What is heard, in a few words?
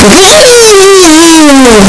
pikachu cry; pokeyellow